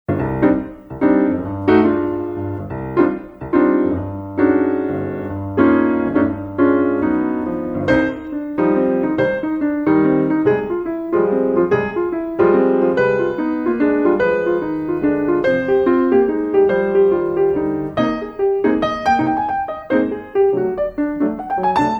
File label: Bach à la Jazz